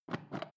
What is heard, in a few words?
foot switch turn on off attempt